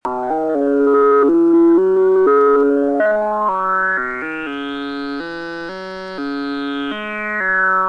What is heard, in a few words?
wahwah